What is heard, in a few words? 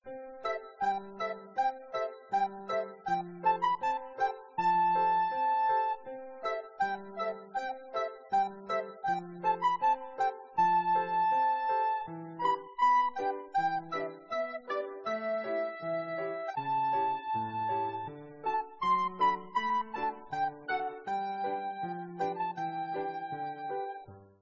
bgm